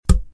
wall Contact, balloons and static electricity

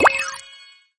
sort Celebration

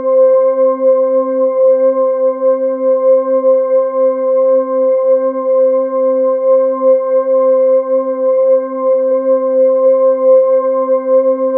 Individual Notes Octave; color vision

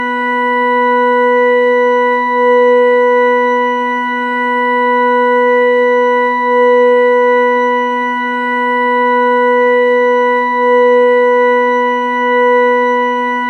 Organ b3; Bodies

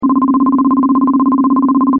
current Loop; ohms law

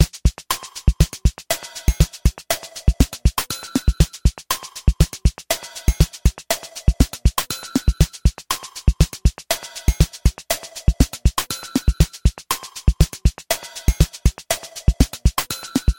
quad track background rhythm complex

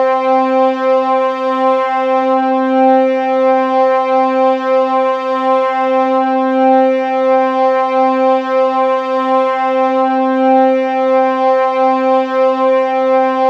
Bodies Brass C3

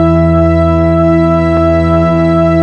Individual Notes Chord; color vision

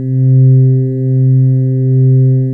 interval Tool Loop

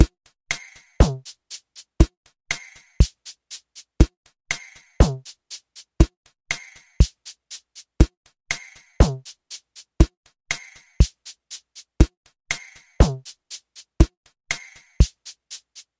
quad Track Background Rhythm Inverted

